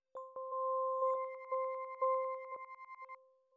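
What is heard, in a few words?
granular kalimba accent C major; bespoke - Floral v.2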